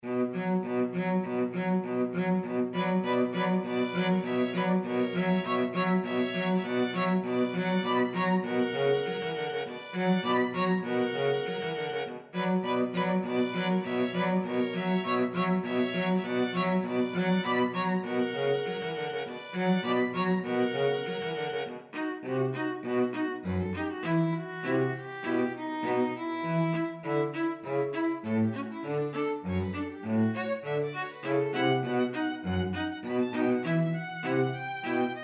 danses des cygnets, string trio

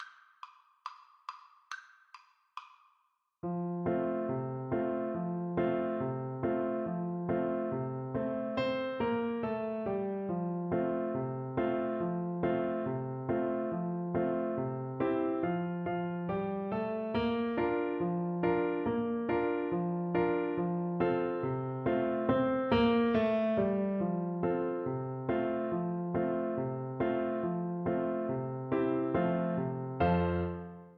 alabama, tuba

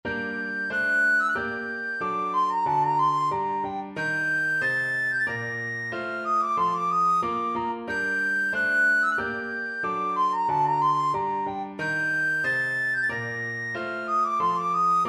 recorder; beveridge maggot

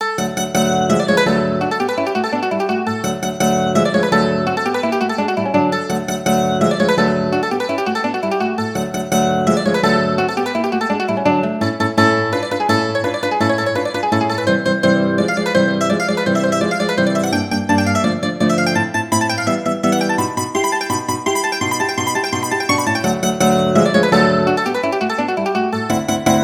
mandolin guitar, mandolin-guitar duet

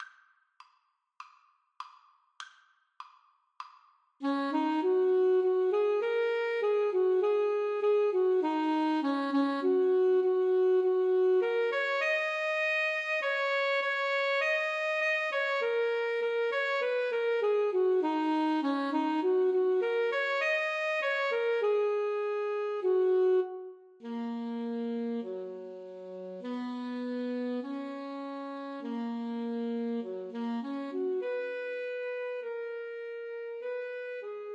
loch lomond A SAX duet

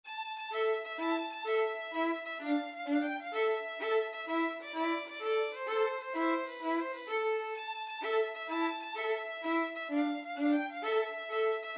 tarantella, violin duet

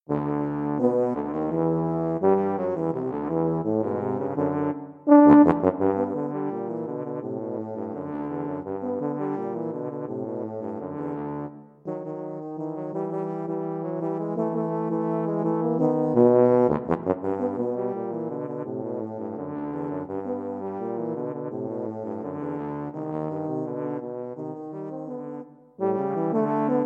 euphonic sounds tuba duet

